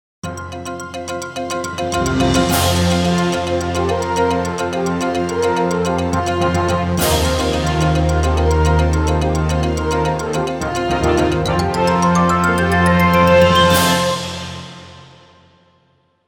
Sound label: FANFARE